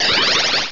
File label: Energy Ball; pokerogue; battle anims